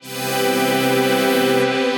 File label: Analog Pad Chord; Synth Pad; Ableton; Factory Packs; Beat Tools